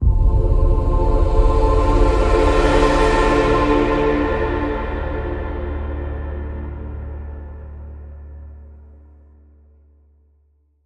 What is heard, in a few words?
Echoes Mystical Swell